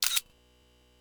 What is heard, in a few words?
Burst Slow One Sec; Asus Padfone-2